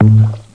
Bonk; MacAddict

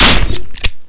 shotgun; Notrium

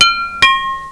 Dingdong; StarGazer's Delight

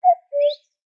natura msg new; Spik

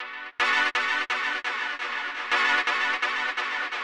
SYNTH PAD HOUSE 125 A; eJay; Sphere

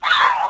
spid hit; DAoC; Toa; Gamestar

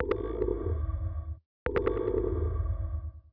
beacon; PC Gamer IT CD 41

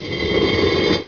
Zzzz; NS-SHAFT 1.2

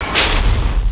bash

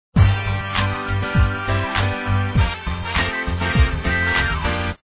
cool sound

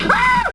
homer screem

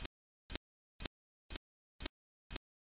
Time Beep Loop; Let's Discover Michigan!

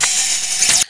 flip r mix, srifle close; SiN Demo

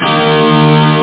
elec guitar

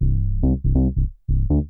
bass E1; Techno; TechnoMusicMaker

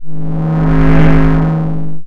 shutter; XboxLive-Remix

